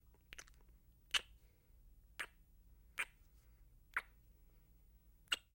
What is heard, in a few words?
Heavy Kissing, Heavy Kissing With Wet Lip Smacks. - Wet Kisses